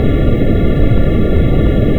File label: craft idle